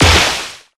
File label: Soda Wave Obstacle Blow Back